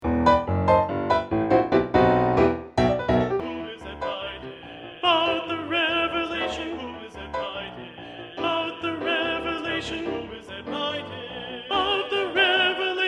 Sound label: Alto 1 Predominant, choir; Practice Track – Alto 1 – John the Revelator Audition